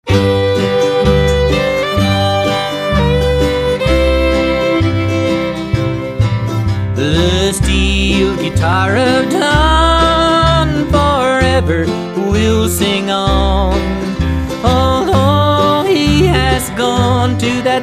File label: The Steel Guitar